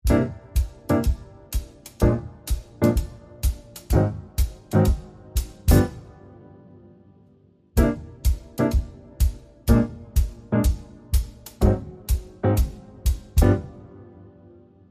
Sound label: Jazzy Jams, No Bass